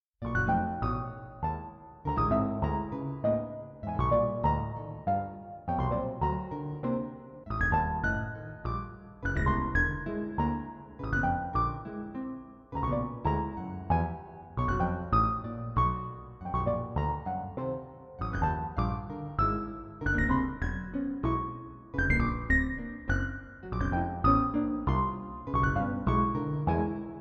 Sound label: Arpa